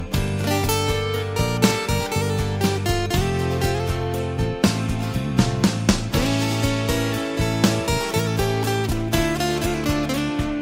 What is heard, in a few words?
guiter music ringtone